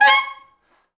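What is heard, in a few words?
tenor sax noise fx; East West; Quantum Leap Horns Sax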